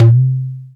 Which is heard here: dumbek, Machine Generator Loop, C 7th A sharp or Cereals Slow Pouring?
dumbek